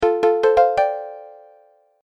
Message Alert Sound, musical

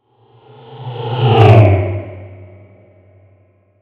Spaceship Pass By, Small, Ship, Science Fiction

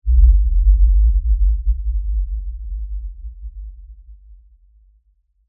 LFE Impact Ultra, Low; Production Element; Imaging Element; Accent; Transition; Low Impact Sound Effects; BlastWave

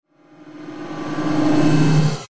Whoosh Reverse Swell; Blastwave

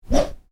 Swish small, Swish: Short, Light; Whooshes; Sound Design; Stuart Duffield

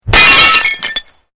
Glass pane smash; Material components for constructing more complex sounds; Materials

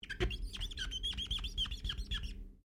GLASS SQUEAK RUBBER SEQUENCE; Material components for constructing more complex sounds

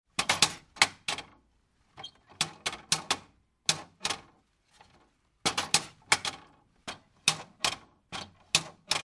Cat Flap Open and Close; Doors

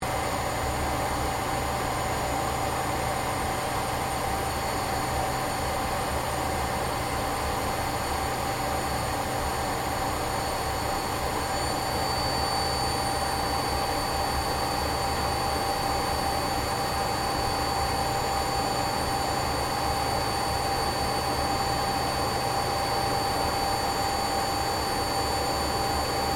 AIRCRAFT CA-27 SABRE FLYING INTERIOR; CA-27/F-86 Sabre; Airplanes; Vehicles

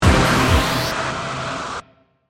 Distortion; Sound Design; BlastWave

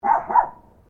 Animal Dog Chihuahua Bark Double; Newcastle; Various domestic dogs; Dog Sound Effects; Dogs (Sound Effects:Animals); Foundation1

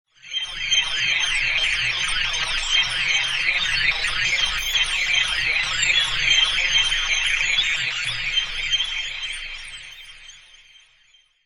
Talking Stars, science fiction

